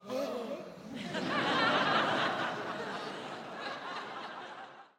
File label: crowd Reaction theater small Reveal; spellbound